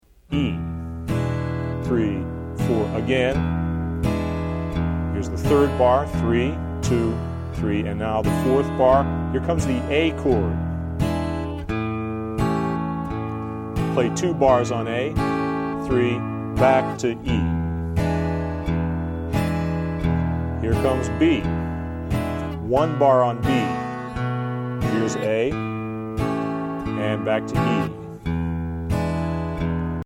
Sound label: Blues Progression In E